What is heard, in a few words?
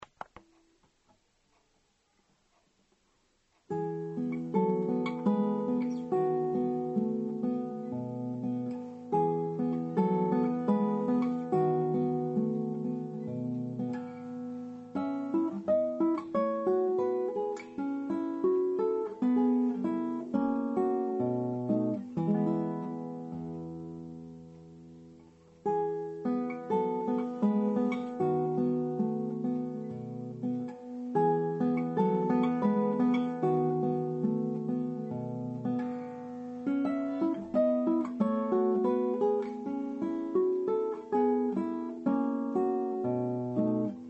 Tarrega Lagrima guitar